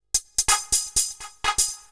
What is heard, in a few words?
XTRA HOUSE 125